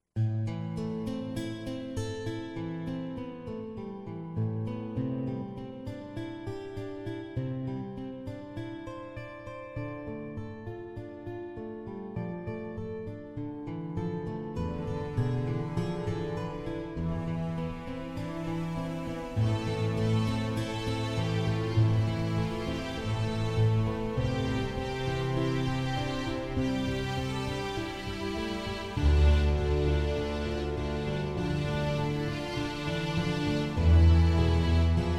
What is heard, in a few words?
instrumental